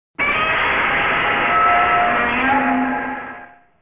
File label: Roar; Super Godzilla